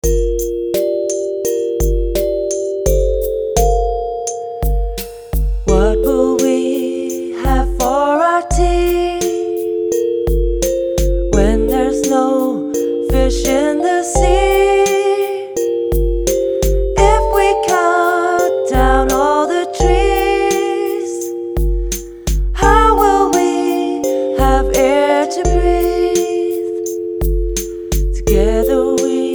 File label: Beautiful World Song